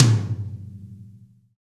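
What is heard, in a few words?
tom, acoustic toms; Roland - Rhythm Section; Real Toms 1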